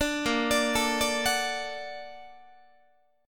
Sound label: A sharp Augmented Major 7th A sharp down Guitar, A#+M7 chord; arpeggiated; JGuitar